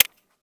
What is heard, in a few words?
fire mode, weapons; arccw